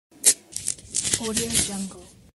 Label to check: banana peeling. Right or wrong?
Right